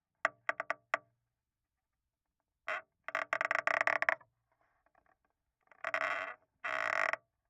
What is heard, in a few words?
floor board creak; sonniss gdc